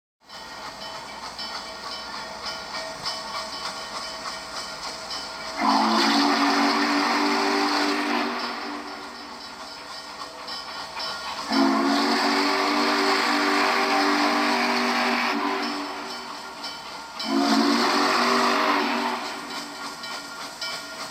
Train whistle

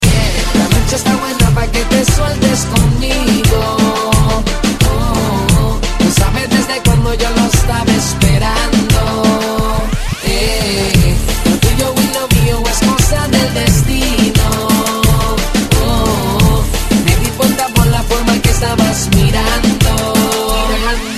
Reggaeton

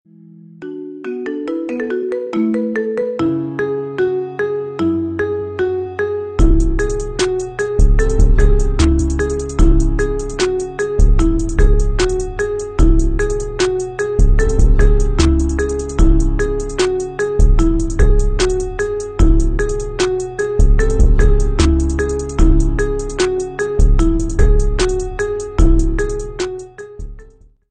Marimba

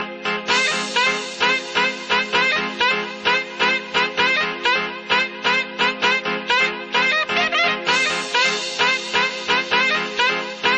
Bongo Star Saxofonia

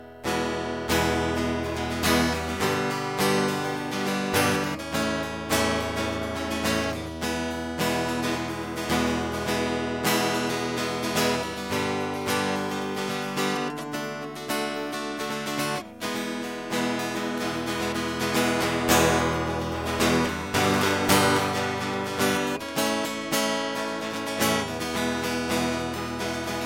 Guitar Chords

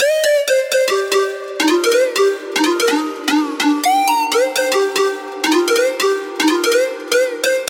Ring Panflute

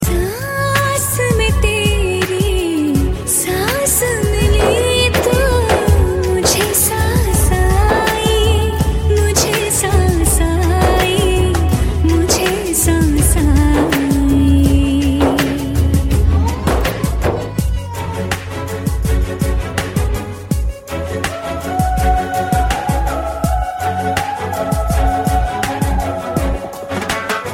Female Sad

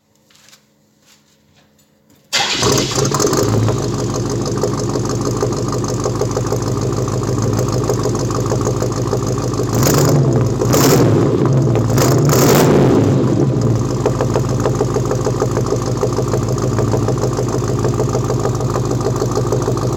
Muscle car start up

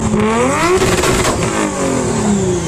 1150bhp Supra 2-step

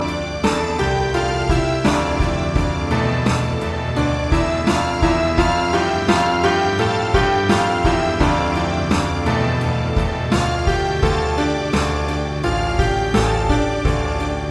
Water song

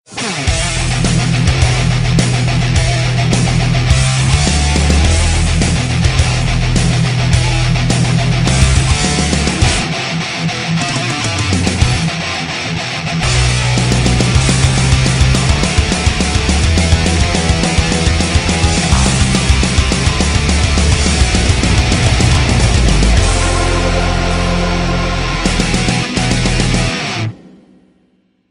Punk Vs Metal

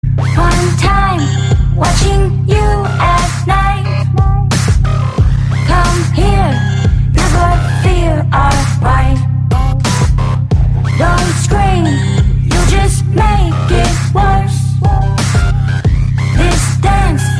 dance floor

